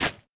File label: push; todee and todpee